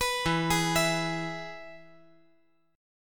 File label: E-Major E